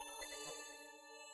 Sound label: NOTIFY